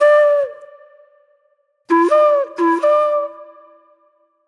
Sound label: Flute Noir